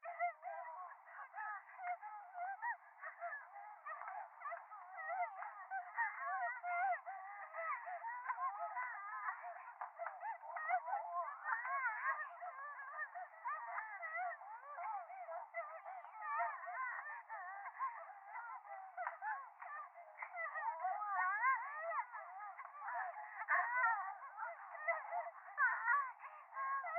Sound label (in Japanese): 電話 喘ぎ 電車